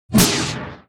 beam axe; Weapon

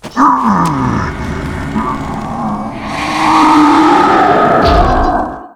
WAR DIE

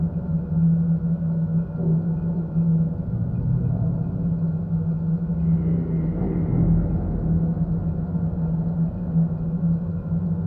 Machine; ambience

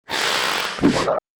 Lizard war power up

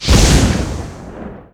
circle flame

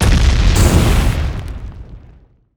Cross Damage; bullet

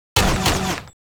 rifle

